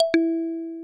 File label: notification